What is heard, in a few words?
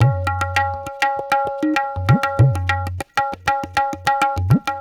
Tabla; Sonic Foundry (Sony Creative Software); Ambient Grooves